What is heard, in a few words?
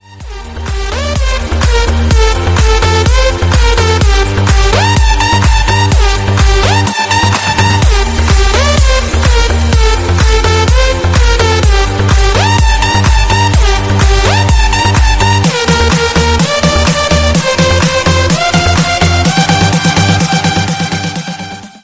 instrumental